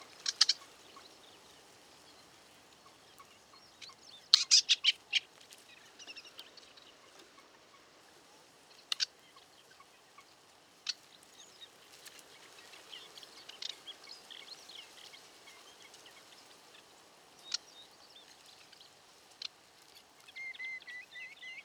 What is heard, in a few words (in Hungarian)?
fulemulesitke hangja